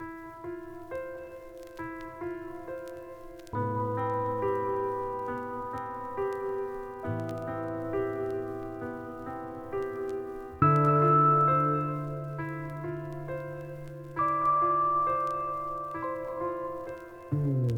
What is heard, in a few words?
piano, Loops Sad